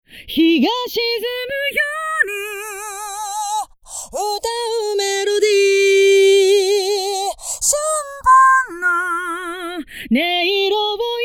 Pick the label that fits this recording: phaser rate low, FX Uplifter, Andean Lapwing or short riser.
phaser rate low